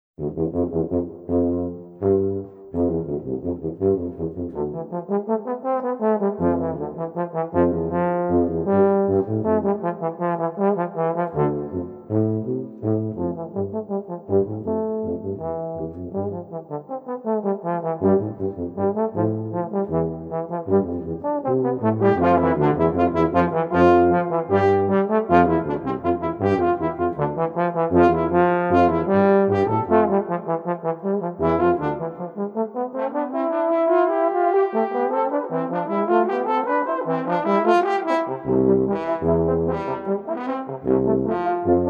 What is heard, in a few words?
Trio for English horn viola and tuba, Scherzo